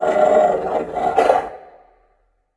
peek squeeze, antlion guard; hl2

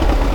crane idle loop; hl2

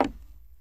wood, player footsteps; hl2

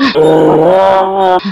idle, zombie poison; hl2

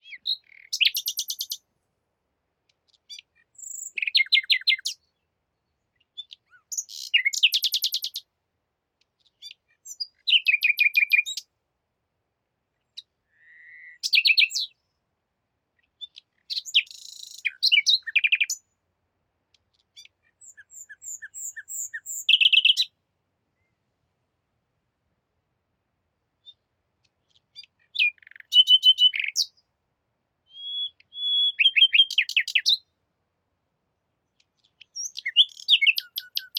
Bird Song, Bird, Nature, Isolated, Spain; Jake Fielding; Sounds of Spain

